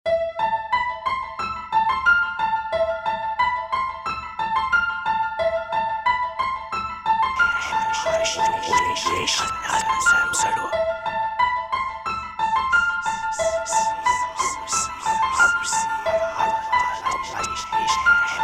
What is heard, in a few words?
BEATS